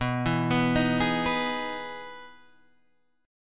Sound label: E Major 7th B, chord